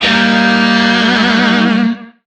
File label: Shawzin Blues Chord; Warframe; Lizzie Shawzin